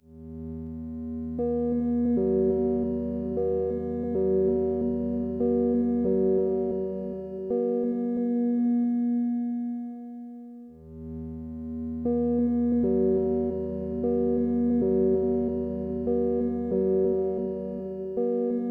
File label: lose